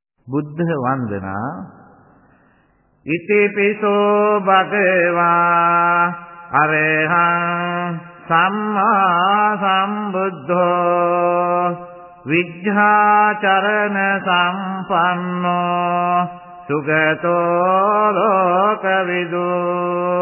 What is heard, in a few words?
chant, Buddhist Chanting